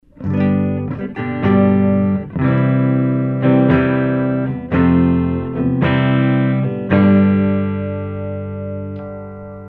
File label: neck clean; bioroids; moscato